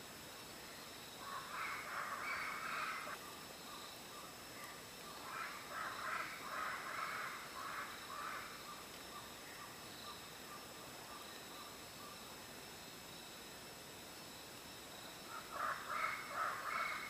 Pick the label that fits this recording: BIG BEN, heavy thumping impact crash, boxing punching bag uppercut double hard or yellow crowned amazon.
yellow crowned amazon